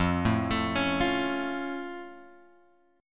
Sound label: F Augmented F; F+ Chord {1 0 X 2 2 1} arpeggiated